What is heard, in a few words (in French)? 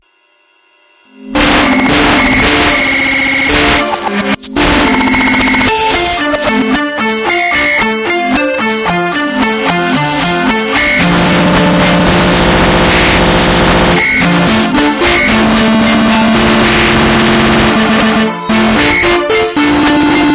Rock progres; Ca tire des nattes Fais voir; Rock progressif